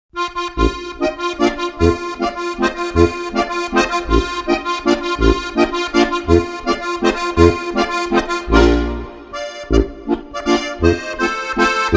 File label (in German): Walzer Gstanzln steirische Harmonika; 1000 beliebte Gstanzln